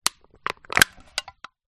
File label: foley drink can cola squash in hand gently